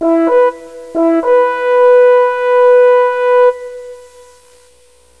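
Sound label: HUNT HRN, Instrument; MUSIC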